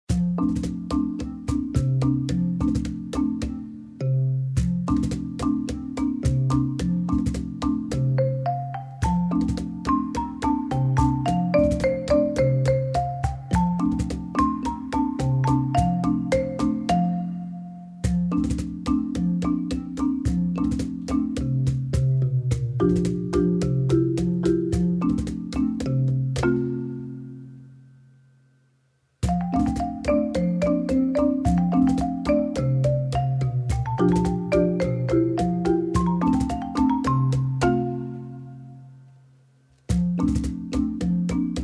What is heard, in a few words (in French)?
les petits cailloux instrumental